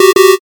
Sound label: wrong